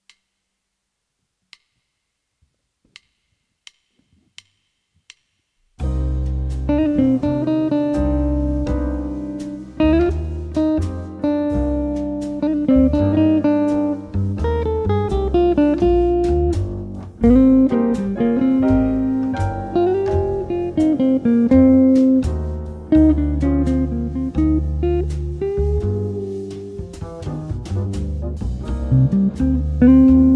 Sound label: Solo on Backtrack